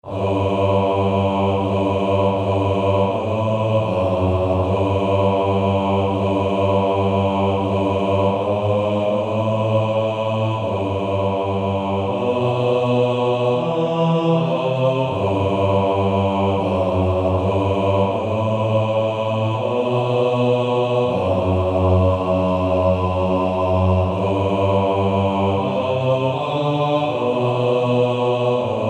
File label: Male Choir